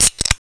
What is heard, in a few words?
open; Desktop Themes; Jolt Cola